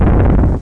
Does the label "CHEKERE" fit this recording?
No